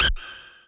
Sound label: lead